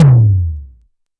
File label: tom, Korg Prophecy